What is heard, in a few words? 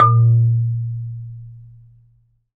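MARIMBA C6; ILIO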